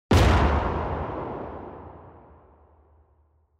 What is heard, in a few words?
Cannon